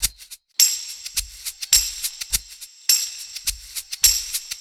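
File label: percussion, Roots Funk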